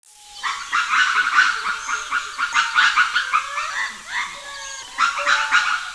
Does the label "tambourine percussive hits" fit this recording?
No